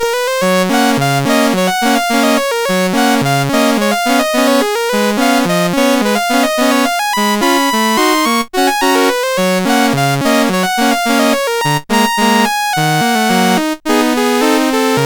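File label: River Chase, MOS 8580 SID chip music, games; CSG8580R5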